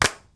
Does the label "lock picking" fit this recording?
No